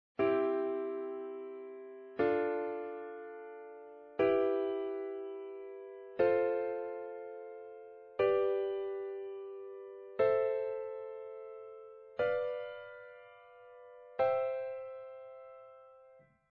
Harmonielehre Leitereigene Dreiklaenge Dur Alle

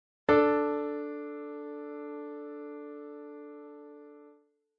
verm Sexte D; Unreine Verminderte Uebermaessige Intervalle

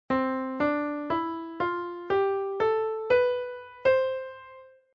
Tonleiter C Leitton; die moll-tonarten

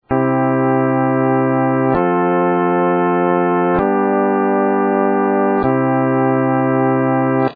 Kad C Mittel Kl Terz

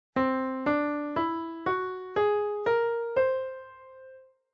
Tonleiter C Ganzton; Weitere Skalen; Tonarten

